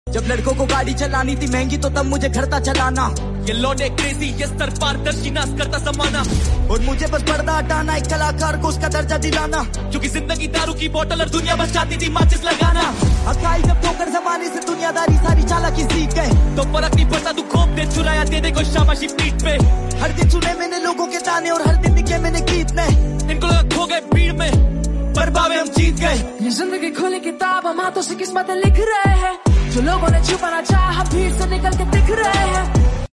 Ringtone

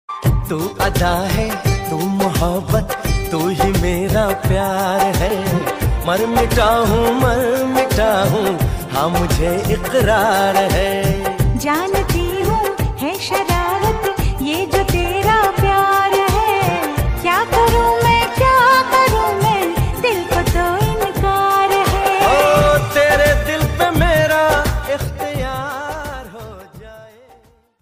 Ringtone

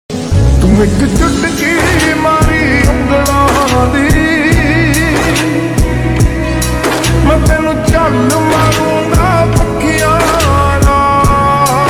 Slowed Reverb

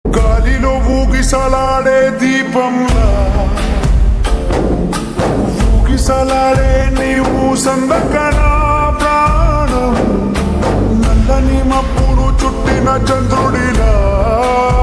Slowed Reverb; telugu

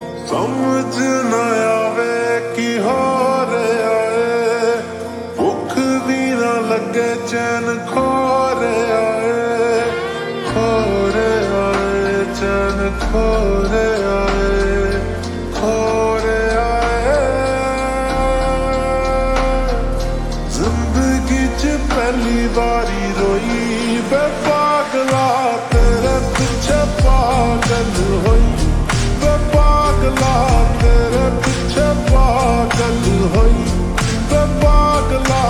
Ringtone Slowed Reverb

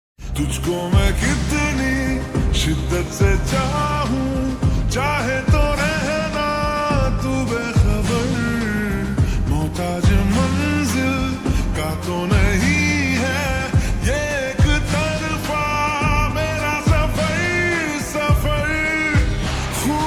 Slowed Reverb

